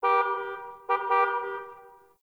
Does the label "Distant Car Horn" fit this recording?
Yes